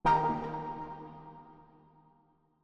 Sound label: Mushrooms Sharp